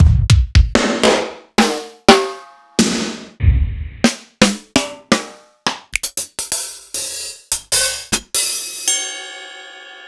Acoustic; proteusvx

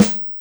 Maple, Yamaha DTXpress Acoustic Snare

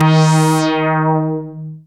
SYN Resotron; Best Service Gigapack 1

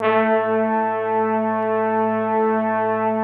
F3 BIG MIX, BRASS; Greytsounds

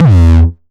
909 Grime Layer 2 - E1, Drums, Kicks; Mbase 11 Kicks

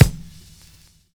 BRUSHING; Univers Sons

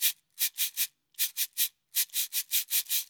CABASA, Liquid Grooves; Spectrasonics; Liquid Grooves 1 & 2; AF.PLANET

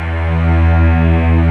Textural Strings

